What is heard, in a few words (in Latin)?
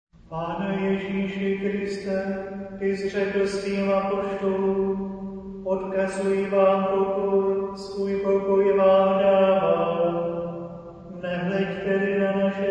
domine jesu choral